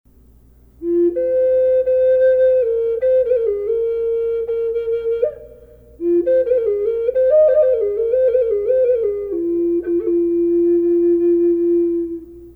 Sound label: reverb burl f minor, flute; barn owl; collector flutes